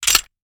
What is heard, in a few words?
slide pull, glock; weapons; Urban-Coalition; Decay; arccw ud